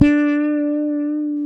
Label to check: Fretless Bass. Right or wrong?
Right